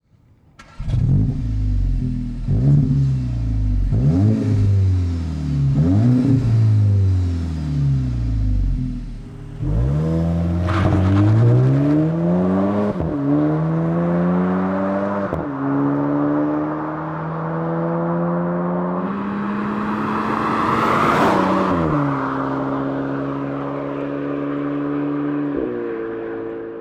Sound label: VW Sound check